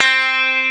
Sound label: FRET C3, Classic Guitars; E-MU Formula 4000 Series Vol. 3 – Analog Odyssey